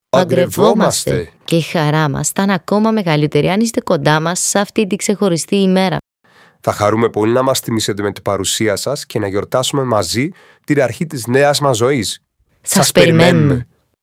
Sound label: vocal